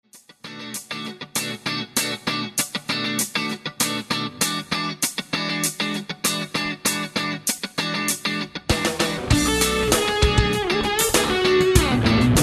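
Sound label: funk humbucker sustain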